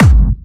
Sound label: Clubby Kicks, Bassdrums